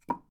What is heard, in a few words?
Wood Impact; Phasmophobia